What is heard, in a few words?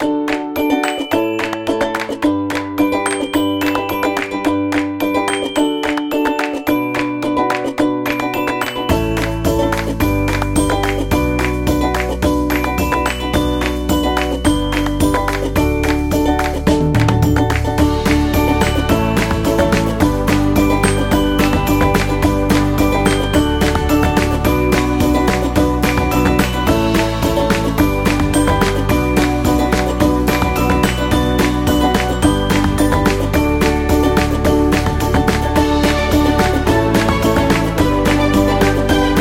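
Birthday Happy, Kids background music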